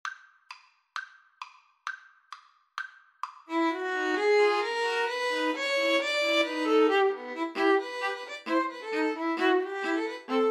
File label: String trio